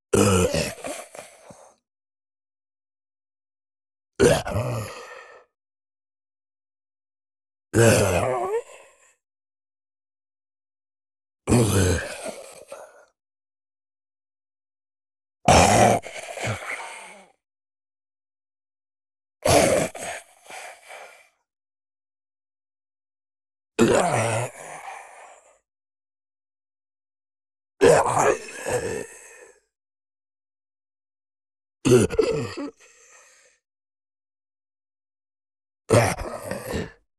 ZOMBIE MALE HUGE GETTING HIT FATAL BLOW LAST BREATH